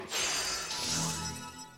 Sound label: progress